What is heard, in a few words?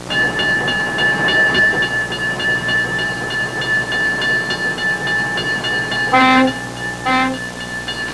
OLD, treni; trasporti